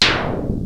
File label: MG1 KIT, MOOG; OLD SYNTHS; Rubber Chicken Software Sample Bank